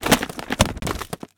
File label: book drop; dictionary